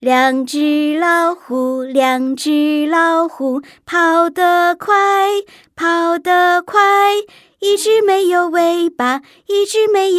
중국여 노래샘플 mix

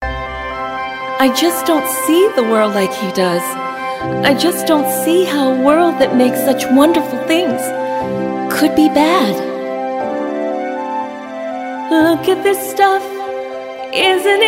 영어 여 노래

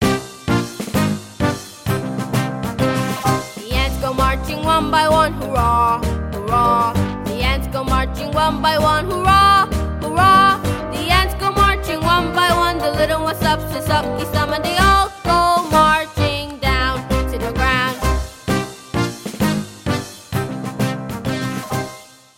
영어여 동요 eng

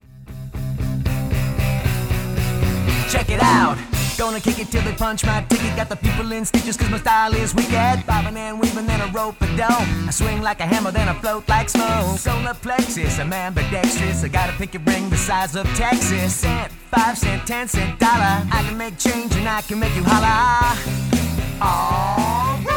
영어남, 노래

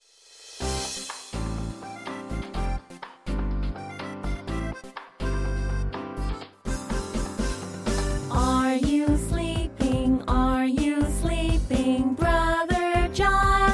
영어 여 노래; Are you sleeping

